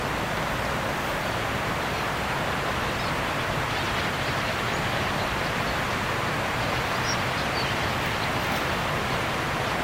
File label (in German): Bergfinken Zwitschern